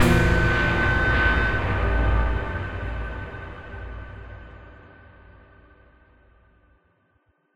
Short Sting; The Solus Project

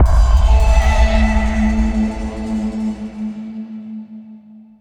Mus Wind Overlay Investigate

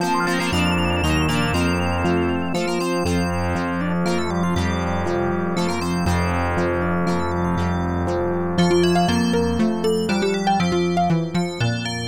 High Score Entry; Off the Wall

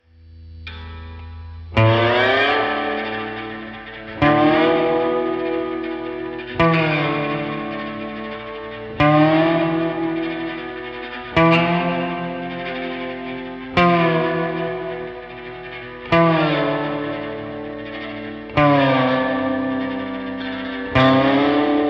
Gtr slide Loop; Bud Spencer & Terrence Hill: Slaps and Beans

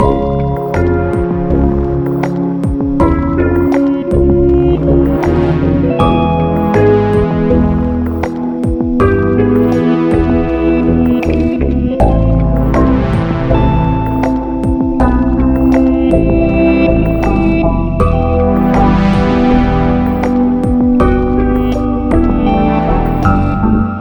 stem bridge; Pix the Cat

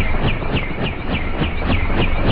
EnergY Ball

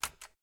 Button Press

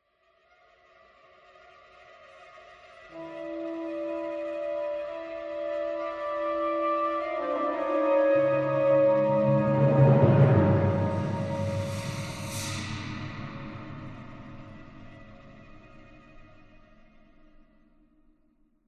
Ambient

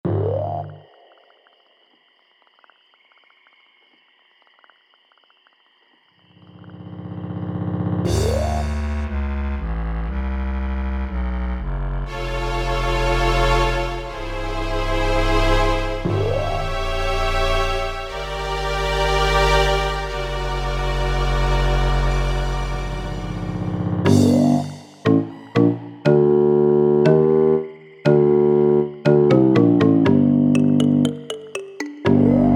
mus swamp; Archvale

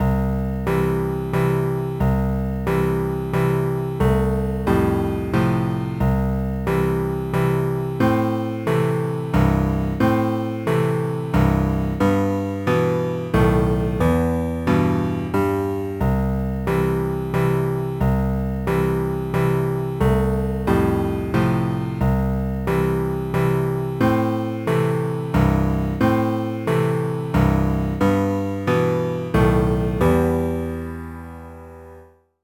BGM PIANO

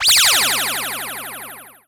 Character Attack

